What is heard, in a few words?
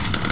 chainsaw